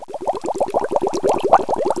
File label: BUBBLES